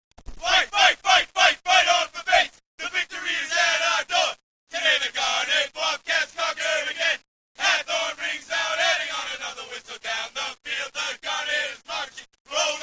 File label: fightsong